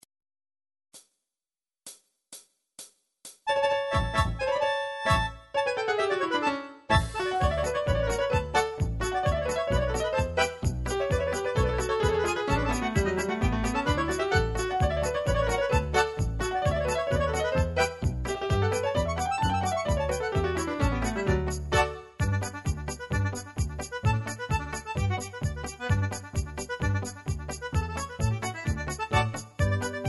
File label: triolet polka, midi